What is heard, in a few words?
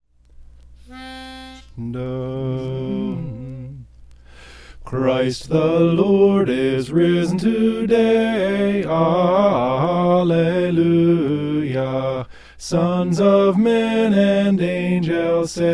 soprano; christ the lord is risen today